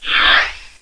Screen Exit Space Woosh; Let's Discover Michigan!

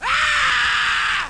Killed; a-10cuba

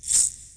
Sound Mouse Down

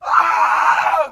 injure

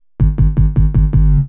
SNTHBASS D+B 160 C, Drum Bass, Bass; eJay

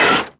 SCRAPE

